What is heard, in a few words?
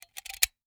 Foley Toy Gun Handgun Cocking